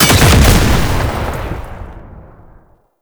Cannister Explosion; Enemies; Abilities; Warframe; Tau; Dax Archer

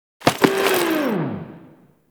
Shred Cast 3D; Warframe; Temple

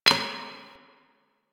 Idle Heavy Hoof Stomp; Ambience; Warframe; Entrati; Tagfer

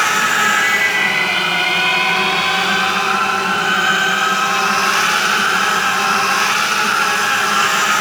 Rifle Void Loop; Weapons; Warframe; Zariman

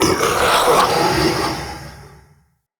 Male Vocal Pre Death; Enemy; Mockery; Mockeries

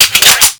Magnum Reload Complete; Warframe; Tenno

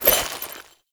Fishing Spear Bait Throw; Weapons; Warframe; Eidolon Fishing Spears

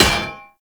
Melee Hammer Impact Generic; Hammers

